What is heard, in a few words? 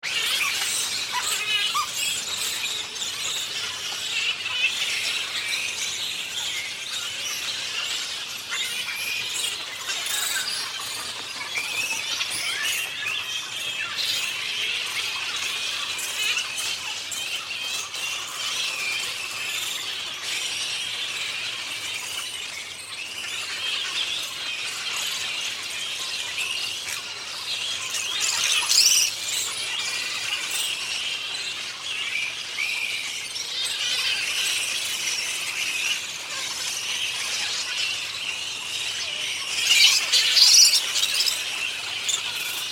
Grey headed flying fox colony; Yarra